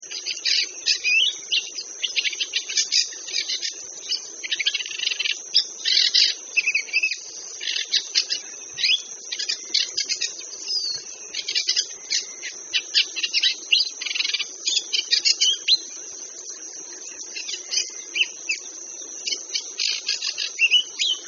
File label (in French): rousserolle verderolle, chant